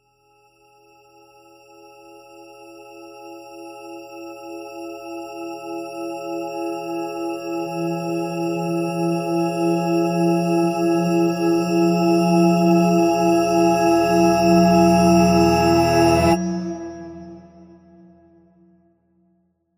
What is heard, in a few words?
Trailer Tools Tonal Riser; Production Music; CATastrophic